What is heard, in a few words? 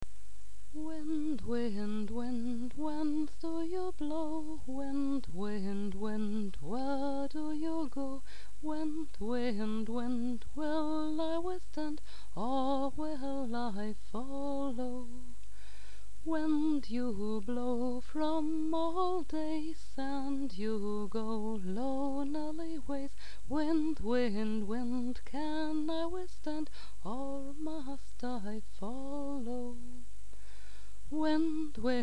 wind wind wind